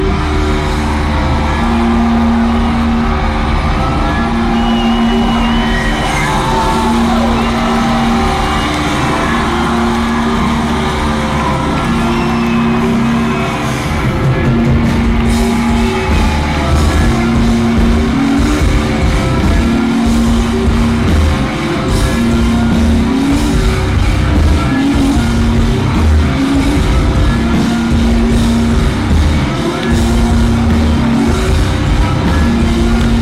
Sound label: Heavy riff